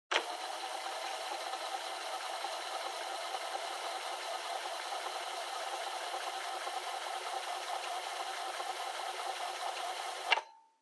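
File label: Portable Cassette Driving Noise Rewind Stop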